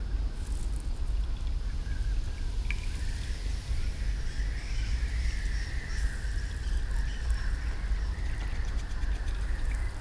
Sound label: Ambience streets; Adam's Venture Origins